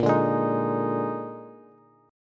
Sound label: Bb 11th, Bass; Bb11 strummed; JGuitar